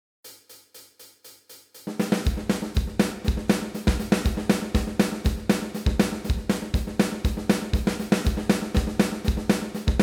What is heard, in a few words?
MIDI blues; spellman studio